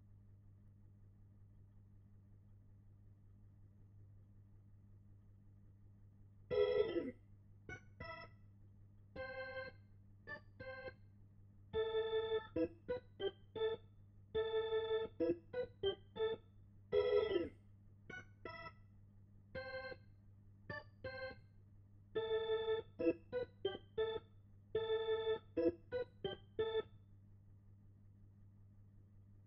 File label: Hammond